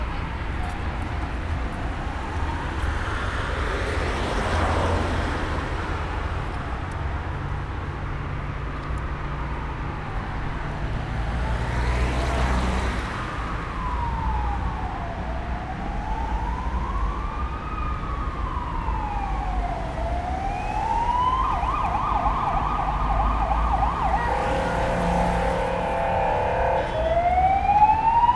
mento; arpad hid robert karoly korut; mentoauto

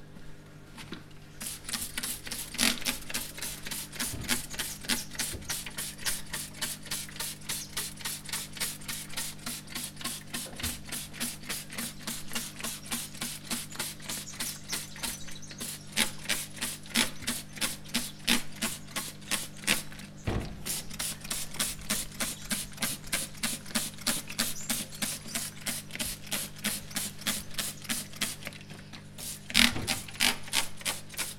a bogár vízzel permetezése, madagaszkári bütykös csótány; szegedi zoo 2011

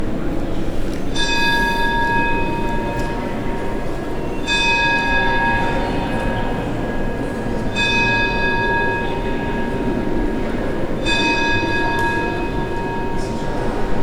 negy csengetes a katedralisban

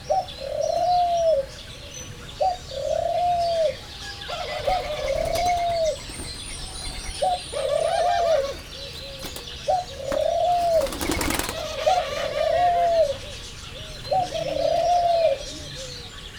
reggel háromszor zöldszárnyú ara, kacagó gerle; jaszberenyi zoo 2015

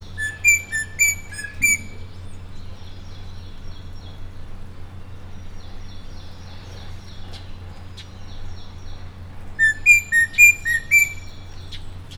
kétszer, rozellapapagáj; miskolci zoo 2018

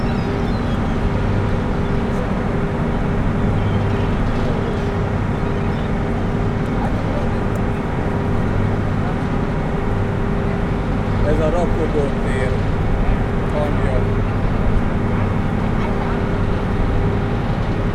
visszaút, komp; thassos

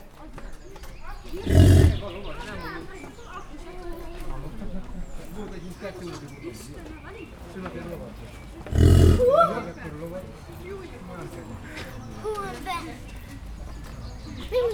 háromszor, amerikai bölény; pecsi zoo 2017

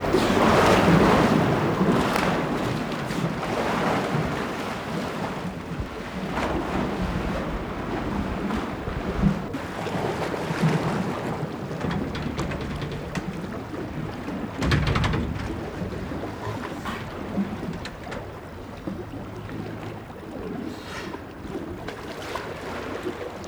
kaliforniai oroszlánfóka vízben úsznak szélvédő; állathangok; nyíregyházi zoo 2010

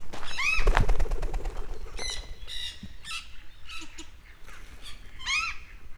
ember elől felszálló, tőkés réce; állathangok; miskolci zoo 2009

